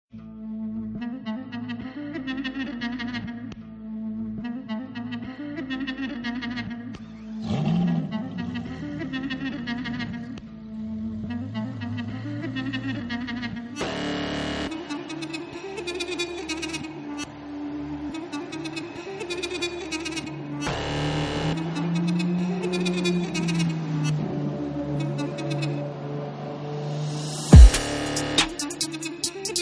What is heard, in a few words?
Kurdish Trap Beats